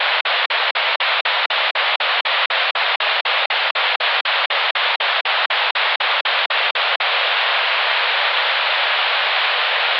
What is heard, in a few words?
noise